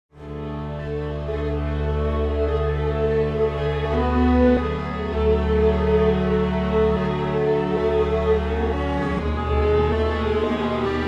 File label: strings; timestretch; celemony melodyne